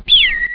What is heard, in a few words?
laser start